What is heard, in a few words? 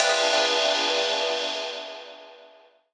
RAW RIDE; Rawcutz; Nu Forms